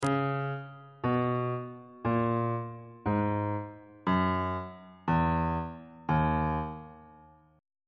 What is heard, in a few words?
e flat phrygian mode descending